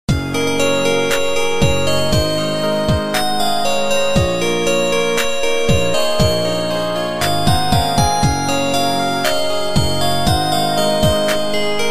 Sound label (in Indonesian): Nada Dering Romance Love Story Korean